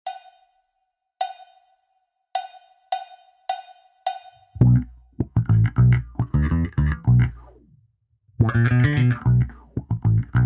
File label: Your Success drumless